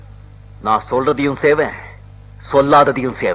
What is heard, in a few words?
tamil